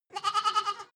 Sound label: goat lamb baa close